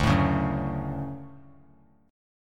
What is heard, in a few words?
D Major 7th, chord; strummed; JGuitar